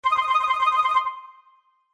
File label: ring synth 04 ringtone galaxy sms